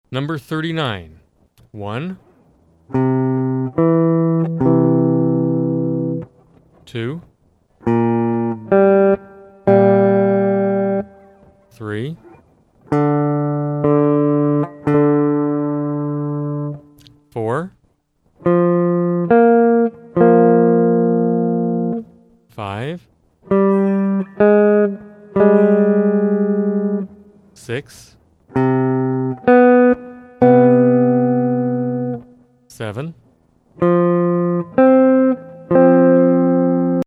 Sample Major and Minor Pentatonic Recognition Test, guitar